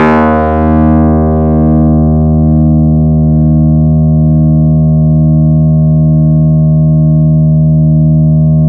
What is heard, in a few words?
MonophBass d#3, Yamaha YX81Z